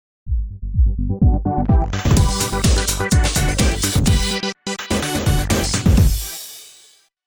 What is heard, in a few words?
electronica